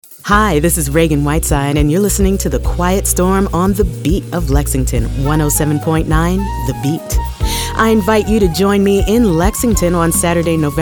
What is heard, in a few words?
Smooth Jazz, Quiet Storm